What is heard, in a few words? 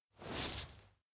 stone show; rikka; anemoi